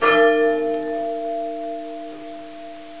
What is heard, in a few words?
bell sounds